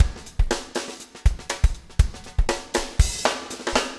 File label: breakout 120, 120bpm, loops